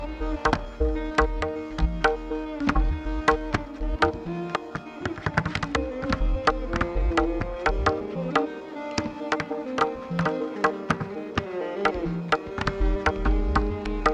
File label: Tabla Teen Taal; sarangi